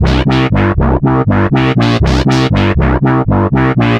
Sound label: Synth Bass